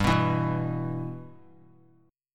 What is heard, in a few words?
G 6th; G6 strummed